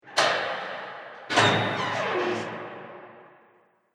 Haunted House Door Open, Creepy Door Sound Effects